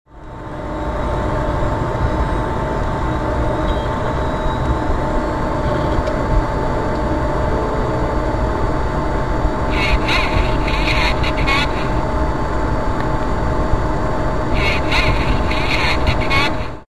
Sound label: memory error; aopen ax4pe max